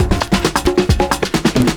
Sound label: PERCS, 70 s Breakbeats